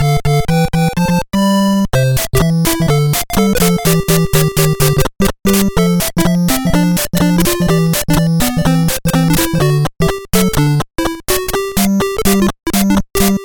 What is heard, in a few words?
Bonus, BGM; Super Mario Bros. Remastered